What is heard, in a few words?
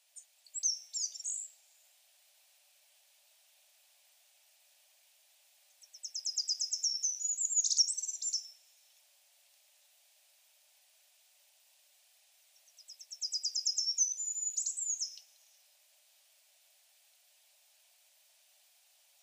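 Goldcrest, furano; koe; kikuitadaki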